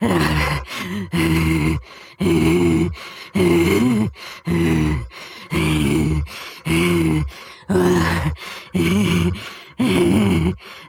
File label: Chase Low; Devour; devour 2021 gamerip pc macos